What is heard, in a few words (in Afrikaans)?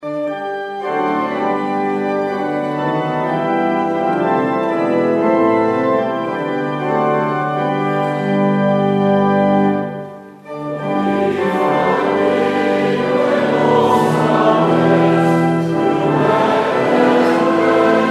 Gemeentesang